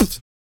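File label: vox percussion, Vocal Planet Jazz FX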